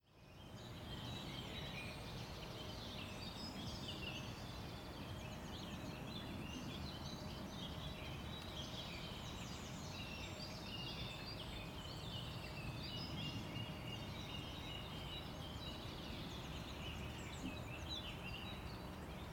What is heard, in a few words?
ambience